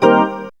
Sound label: organ, c, Garage Organ; Dance Synths